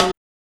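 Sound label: HSE SD, house snare drum; X-Static Goldmine 1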